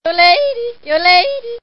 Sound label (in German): jodeln